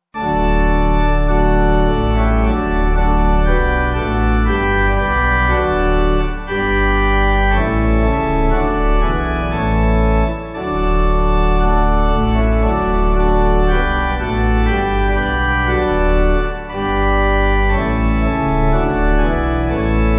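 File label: Pipe organ, church music backing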